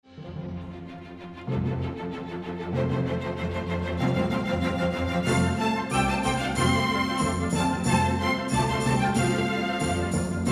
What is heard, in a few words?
Taiwanese Dance